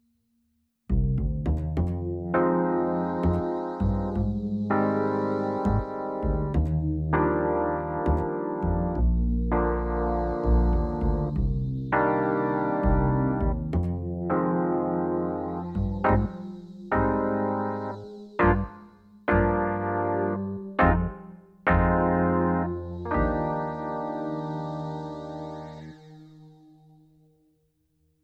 Distortion EP

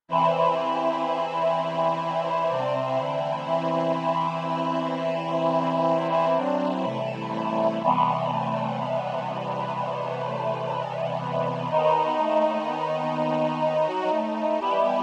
All In VC340

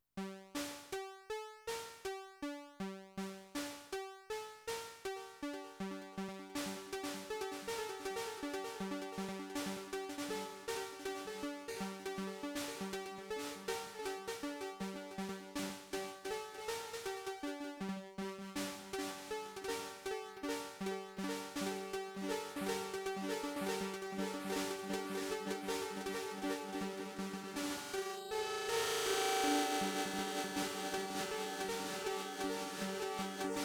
delay

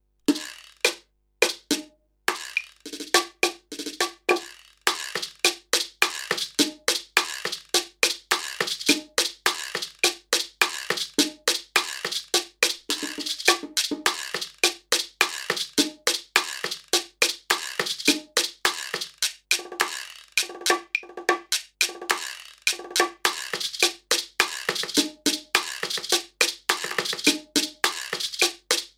Latin Cuban Son

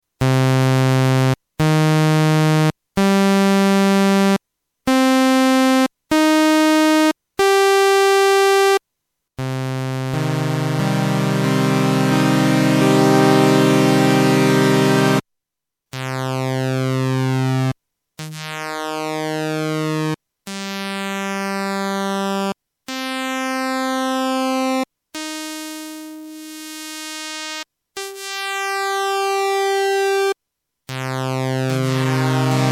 Venom 2x Saw Vergleich

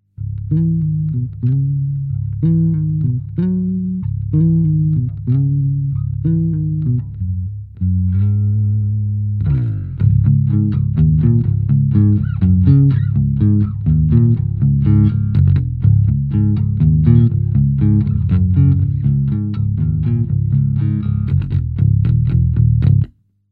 Bass Beyerdynamic 930

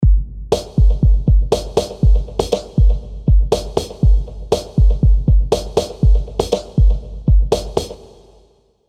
Drumloop Vox Snare

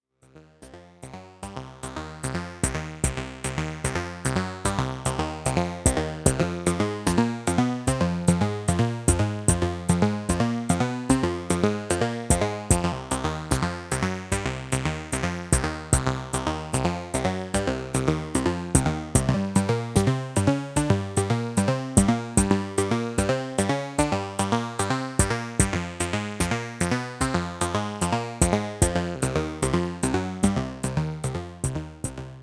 ARP1601 Boogie

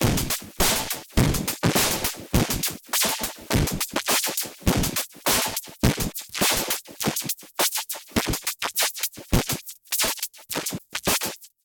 Waldorf 2 Pole Drums Overdrive and LFO

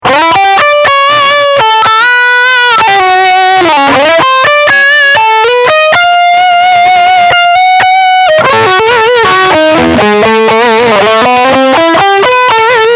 5708 Humbucker Distortion

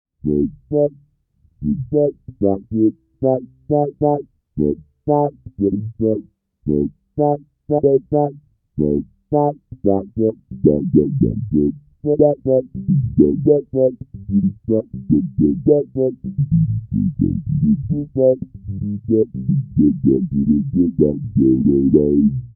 Octafilter hiQ full

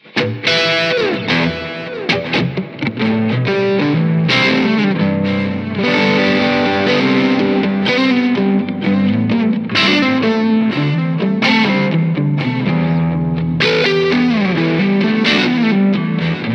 IR Morgan Plate Reverb; Torpedo Captor X SE

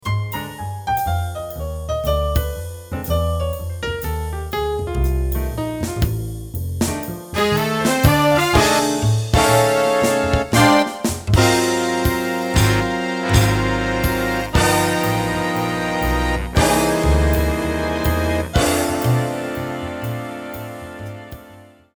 Jazz; HyperCanvas

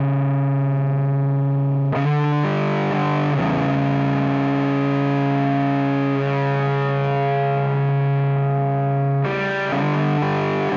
Amplitube X-Drive Crush

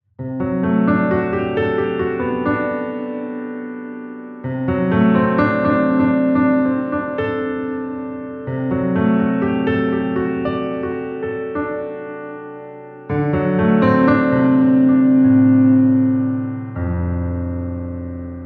RaumMaschine Piano Art Gallery; Tegeler Audio

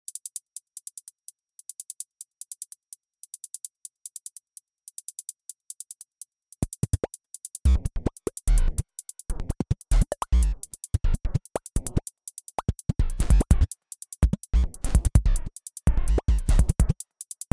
Erica Beat

